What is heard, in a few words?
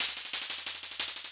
LOOP, Drum & Bass, 180 BPM; Ueberschall; Drum & Bass Resonance